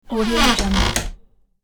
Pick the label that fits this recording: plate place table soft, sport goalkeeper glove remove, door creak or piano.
door creak